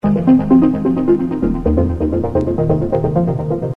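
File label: trip sequence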